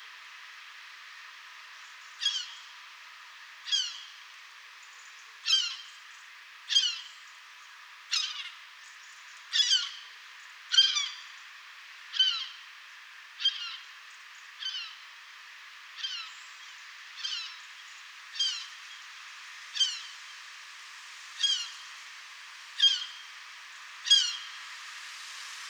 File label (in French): goeland leucophee larus